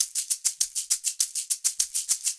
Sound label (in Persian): لوپ شیکر